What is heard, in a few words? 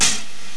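closed hi hat; jazz jackrabbit 2; M8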